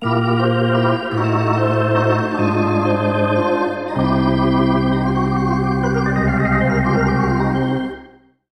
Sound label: Hockey Organ